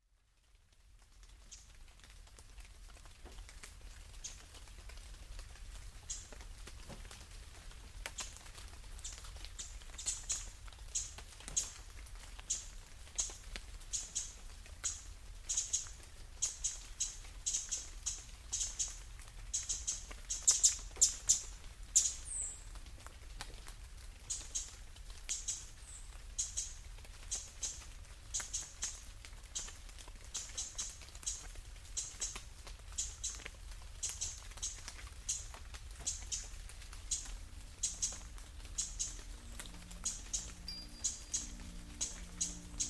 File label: rain